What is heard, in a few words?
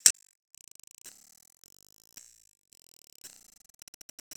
Loop, 120 bpm, Stutter Sounds; 99Sounds; Mangling Audio